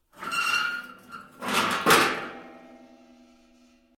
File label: METAL PLATES SCRAPE CRASH; Metal (Sound Effects:Materials)